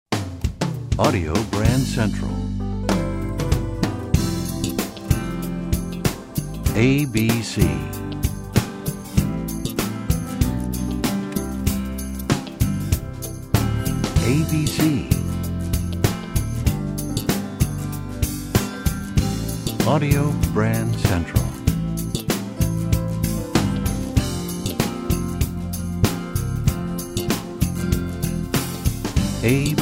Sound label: Desert Wind, radio jingles